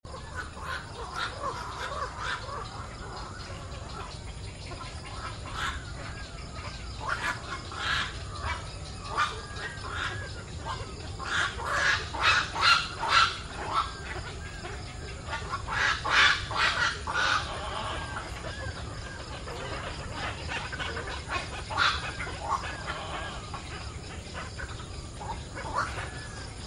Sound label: waterbird roost